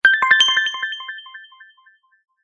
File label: Notification; Onscreen notification jingle (Recording); zelda